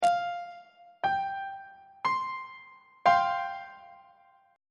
b sharp suspended 4th 1st inversion on treble clef